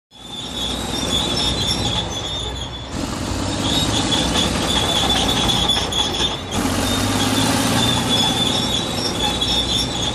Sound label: Digger; Large Digger At Work With Second Vehicle In Background; earthmover